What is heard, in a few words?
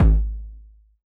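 bass drum Jungle, Yamaha DJX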